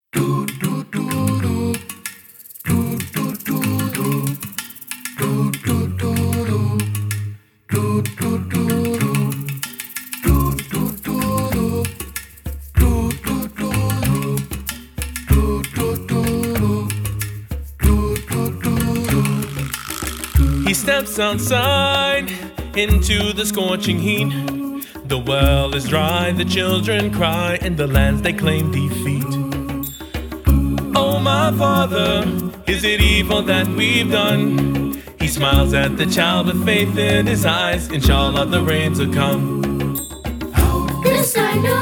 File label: rain song; Nasheed; English